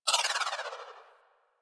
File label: Melee Charge Turbo Dash Activate; Warframe